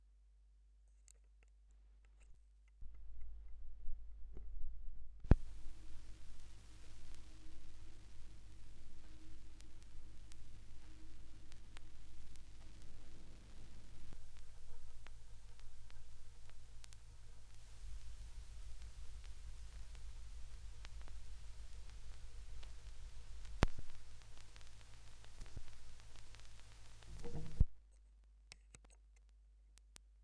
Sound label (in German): schallplattengeraeusche